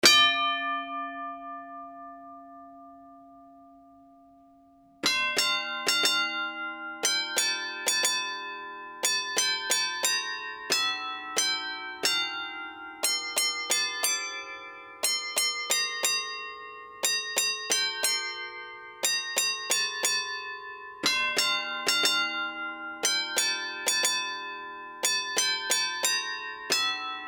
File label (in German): tram glockenspiel o tannenbaum; O Christmas Tree; Originally made for a christmas video.